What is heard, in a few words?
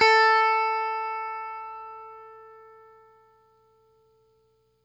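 Stratocaster, Guitar; Quantum Leap; 90's Sample CDs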